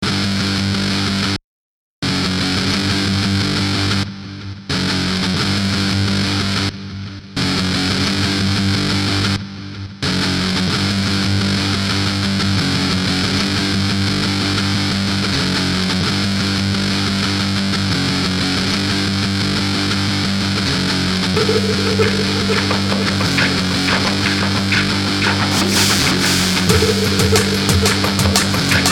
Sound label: jingle web tv